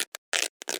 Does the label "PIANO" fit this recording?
No